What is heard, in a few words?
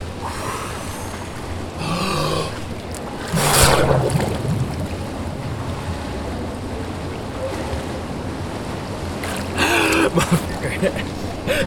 Submerge